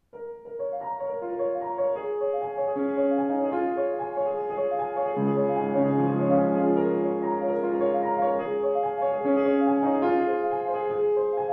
Bechstein, klavier; 124 bechstein elegance silent

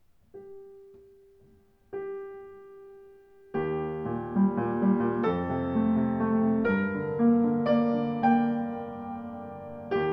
Sauter Klang, Klavier

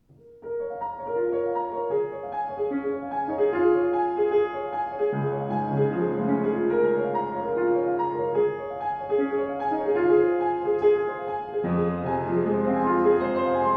Schimmel, klavier; schimmel 106 eiche

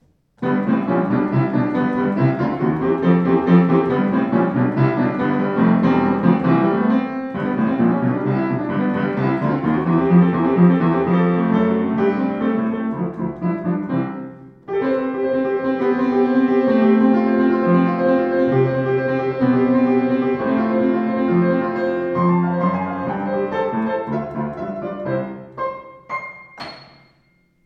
Sauter Rag, Klavier; 118 sauter r2 rr schwarz

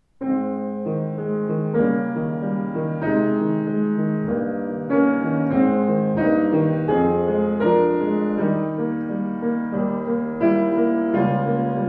Steinway Z, Steinway & Sons Z 115 Piano gebraucht Klavier; 115 steinway z nussbaum

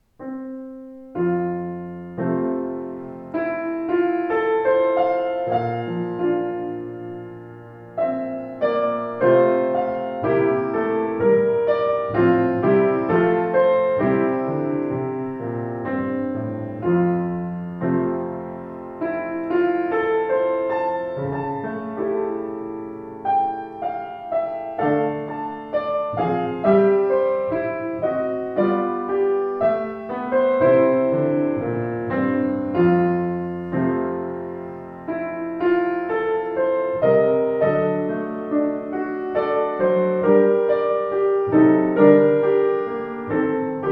Schimmel Kirsche Traum, Klavier; 117 schimmel kirschbaum

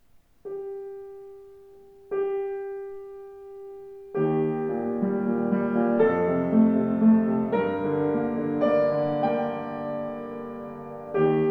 Schimmel Klang, Klavier; 118 schimmel nussbaum

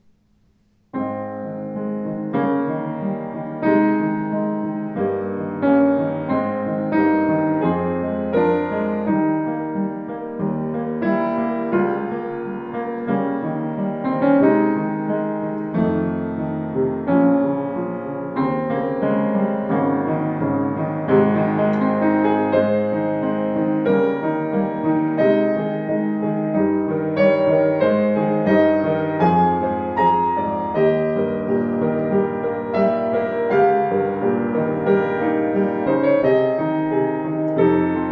Grotrian, klavier; 124 grotrian steinweg classic 1997 schwarz

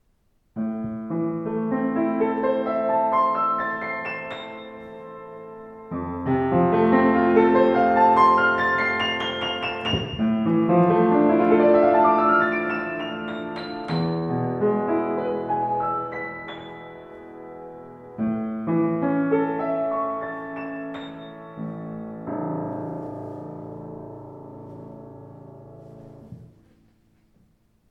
Sauter Pure Basic, Klavier

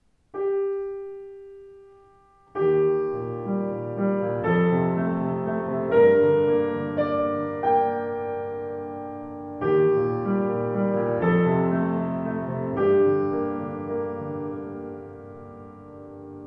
Schimmel Kirsche Klang; 117 schimmel kirschbaum

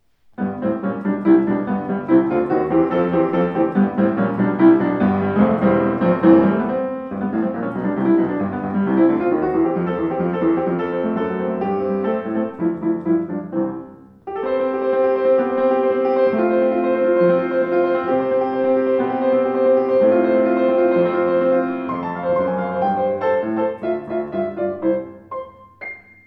Schimmel Rag, Klavier; 109 schimmel nussbaum

